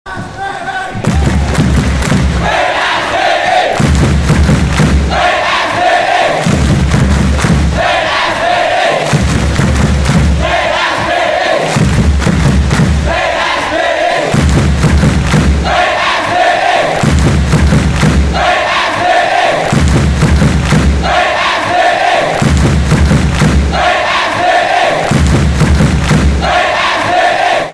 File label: freedom gate claps